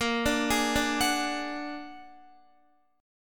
Bb Augmented 7th Bb down Guitar 4F,4A,5C,5G,5C,6E; Bb+7; arpeggiated; JGuitar

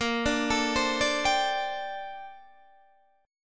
Bb Major 13th Bb Guitar Open D

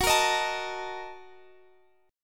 Bb Major 7th Suspended 4th Sharp 5th

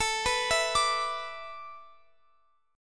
A Suspended 2nd Suspended 4th, Guitar, Open F; Asus2sus4; arpeggiated

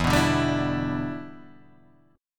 E Minor 7th

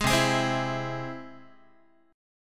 C Augmented, Guitar, chord; strummed; JGuitar

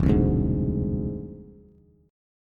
E Augmented 7th Bass; strummed; 0,3,0,1

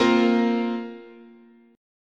Bb Suspended 2nd, chord, guitar

